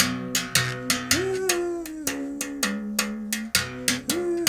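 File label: 79 bass berimbau phrase; Roland LCDP11 Africa VOL-1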